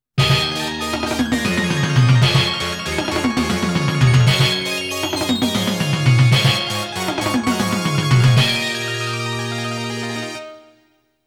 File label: Game Clear